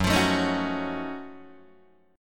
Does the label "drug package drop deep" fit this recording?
No